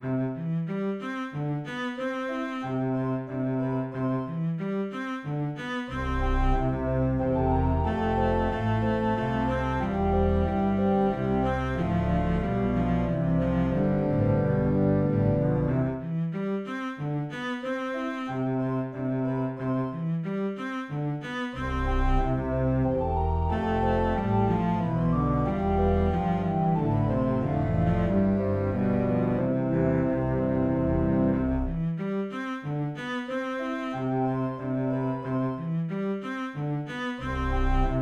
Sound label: Organ